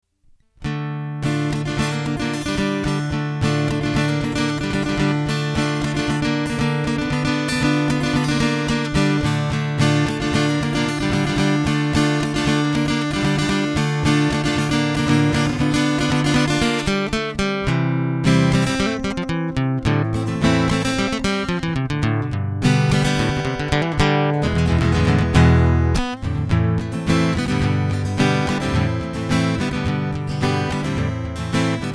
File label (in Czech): kytara